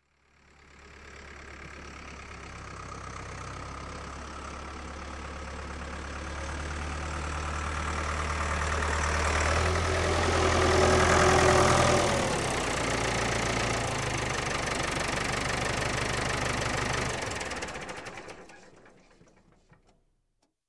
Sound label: Tractor approaching and parking